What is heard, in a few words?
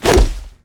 grenade throw; combat